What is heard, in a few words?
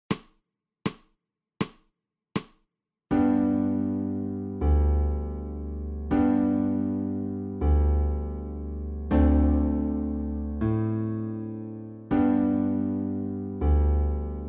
SOPRANO